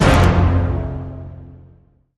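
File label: Hit Orchestra Tutti F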